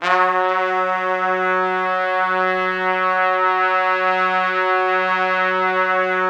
F#2, Orchestral Brass; Best Service ProSamples vol.20; AKAI

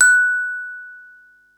mallets

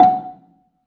BELA F#4, BELAFON, Asian Traditions; USB Soundscan vol.60; AKAI

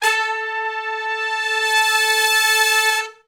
Hi Long Note (A), horn; Sections

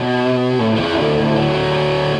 Krush, Electric Guitar; Sonic Foundry (Sony Creative Software) - Friendly Fire

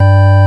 ORG VOX, Continental

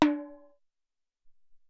Timbal

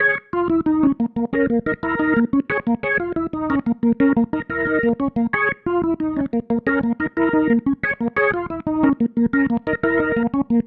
Pattern, Organ

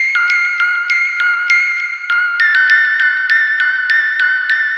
Synth, Trip-Hop Loops; Sonic Foundry (Sony Creative Software) - Rondo Brothers Trip-Hop Loops & Samples; El Corazon

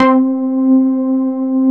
DAN, synthesizer bass; VFX-SD

